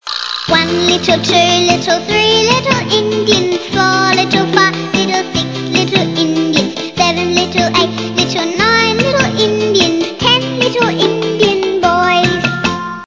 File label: sing